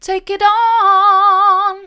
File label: mid, vocal; take it on